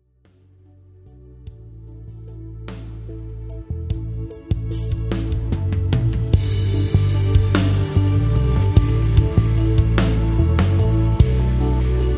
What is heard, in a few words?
rhythm